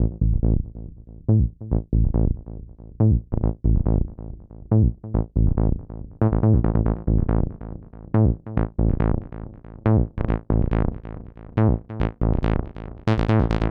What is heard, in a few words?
HARD BASS 140 A, Hard Trance, Bass